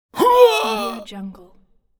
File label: male pain